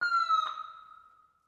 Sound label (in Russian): korotkij zvuk signalizacii odinochnij